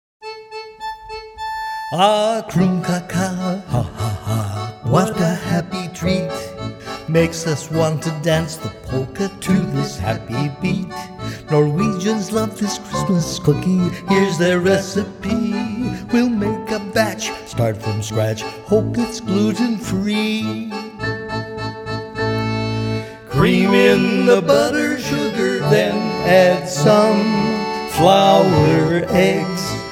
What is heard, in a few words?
Polka; Krumkake Polka